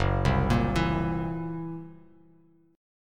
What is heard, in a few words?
Fsharp 7th Flat 5th down Bass Standard; arpeggiated; JGuitar